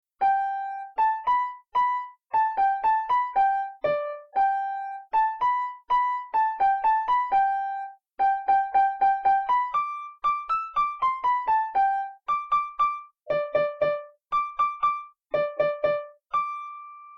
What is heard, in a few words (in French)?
piano; Aouette